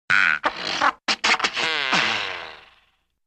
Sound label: bugle sputters